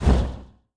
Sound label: Brimstone Hellblast Sound